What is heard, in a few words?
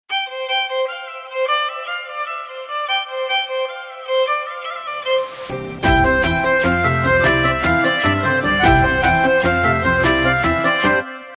Guitar BGM Ringtone; Maara; Tamil